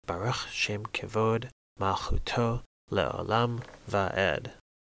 Shma baruch shem kvod only

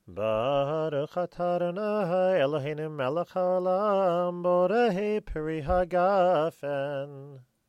wedding blessing

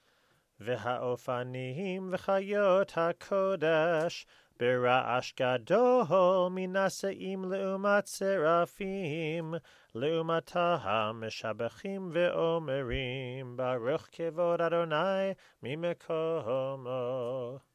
vehaofanim

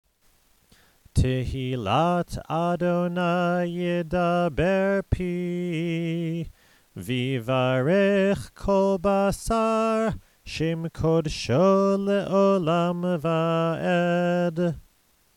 ashrei

